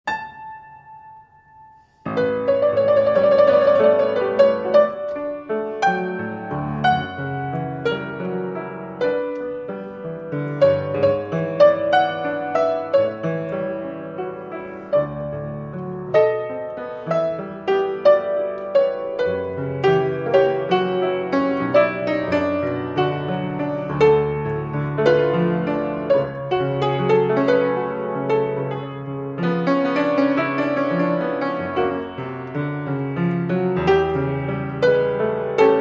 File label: erard piano nocturne in e flat; jane stirling